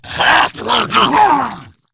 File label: Attack, Battle Barks; Warframe; Grineer Ghoul Sawman